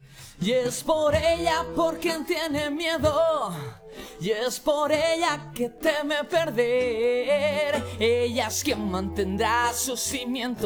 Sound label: voice; sound source separation